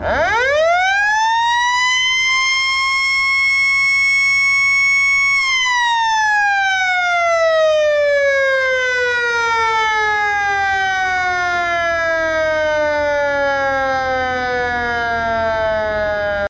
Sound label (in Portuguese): Som, sirene alta potencia incendio; EG-100B; eletromecanica; Engesig Sinalização e Veículos Especiais